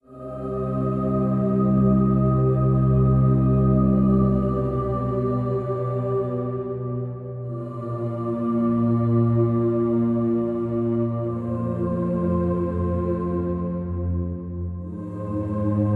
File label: Dreamy Choir